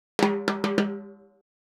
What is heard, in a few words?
Timbali, Latin Percussion; Sonic Foundry (Sony Creative Software)